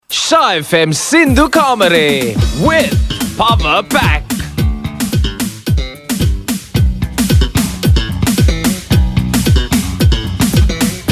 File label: Bass Solo; ShaaFM Sindu Kamare